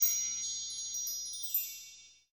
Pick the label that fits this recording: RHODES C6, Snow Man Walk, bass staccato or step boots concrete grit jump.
Snow Man Walk